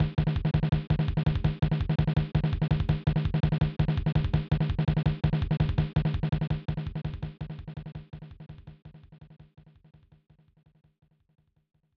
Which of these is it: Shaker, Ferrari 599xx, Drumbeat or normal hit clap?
Drumbeat